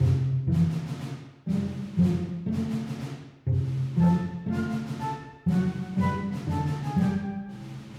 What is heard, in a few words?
Jingle Loop